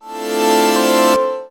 Synth Shots; Vengeance Samples

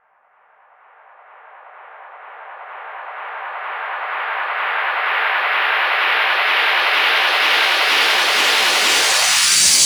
FX Uplifter

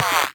Minimal Perc; Minimal Percussion

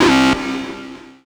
303 Acid C; Vengeance Samples

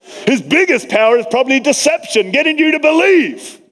Vocal Phrases; Vengeance Samples; Vocals

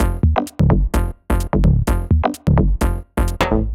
128BPM Melody; Vengeance Samples; Cool Kit

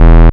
Offbeat Bass E; Vengeance Samples

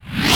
Short Fills & Reverse; Vengeance Samples

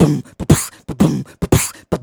Beatbox Loops, Human Beatbox

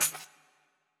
hat; Dubstep Sample Pack

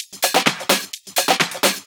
Houseloops 128BPM; Vengeance Samples

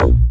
Offbeat Bass A#; Vengeance Samples

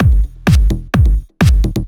Housebeats 128BPM; Vengeance Samples

